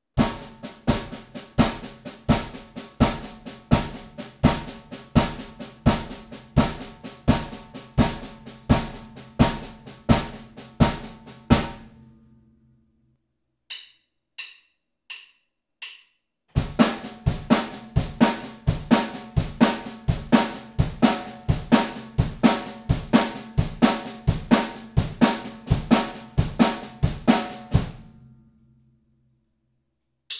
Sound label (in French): triolet